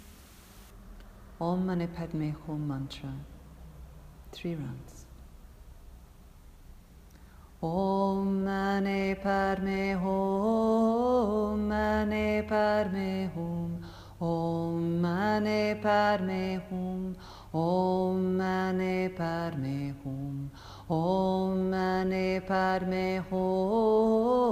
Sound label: Om Mani Padme Hum